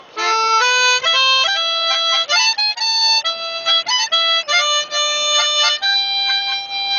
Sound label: Harmonica